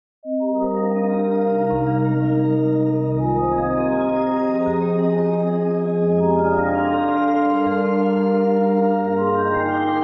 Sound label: Arturia Pigments 3 Harmonics Intro Pad